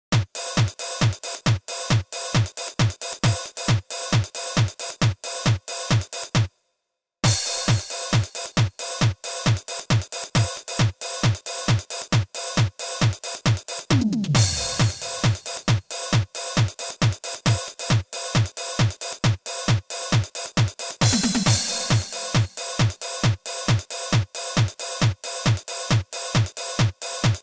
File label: drum test